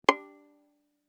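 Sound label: Violin pizzicato fortissimo on A string C6 stereo; MIS Pitches - 2014; Strings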